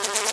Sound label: fly buzz